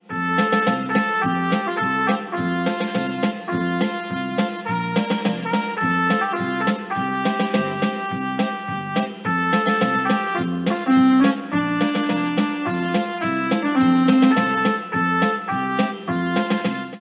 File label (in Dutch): Glorieklokken